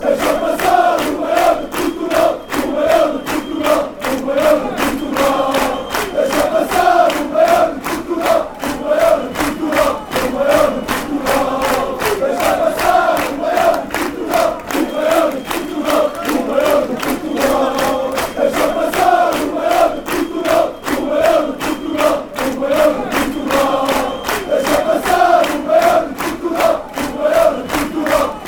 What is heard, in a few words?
the greatest in portugal, chants